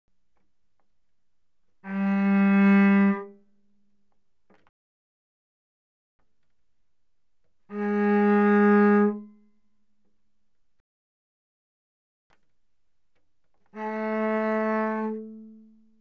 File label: Cello arco mf sul C C4Gb4; Strings